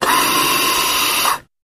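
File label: Hospital Respirator Single Short Blast, Close Perspective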